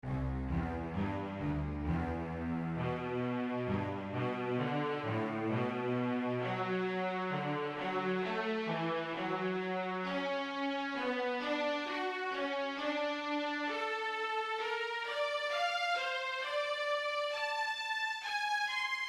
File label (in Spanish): Modulaciones